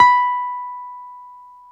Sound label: Wurly, Keyboards of The 60's and 70's